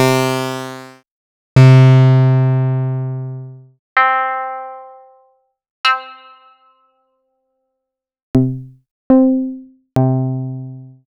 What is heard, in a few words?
5U Modular Leads and Keys